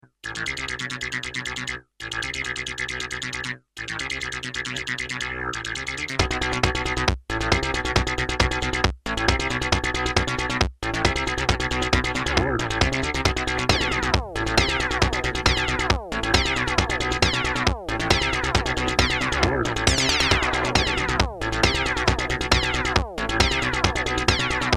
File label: casio cz 5000